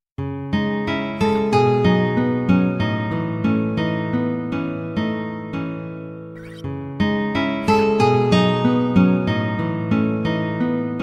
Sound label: Game Lost; WWF